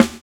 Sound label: swing